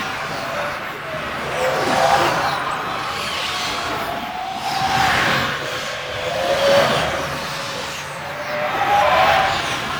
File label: Apparition Loop; Warframe; Warframes; Dagath